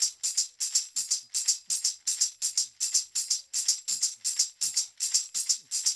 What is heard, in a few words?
percussion loop; InVision Interactive; Percussion Slam; SAHARA